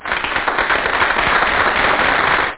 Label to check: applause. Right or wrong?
Right